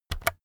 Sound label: keyboard external key press fast